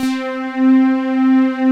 WARM PADS; Pro-Rec

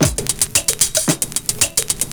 PERCS, 70 s Breakbeats

